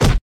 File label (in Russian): rezkij chyotkij bistrij zvuk vistrela